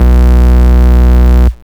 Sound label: Root B, Ultimate Bass; Vengeance Samples